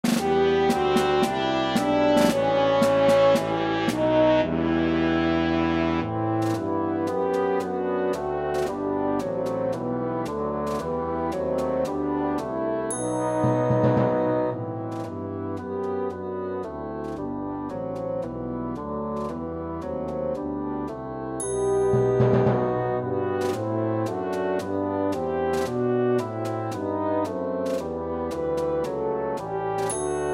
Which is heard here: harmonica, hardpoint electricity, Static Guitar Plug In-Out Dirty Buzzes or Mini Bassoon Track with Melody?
Mini Bassoon Track with Melody